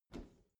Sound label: bathroom cabinet open soft smooth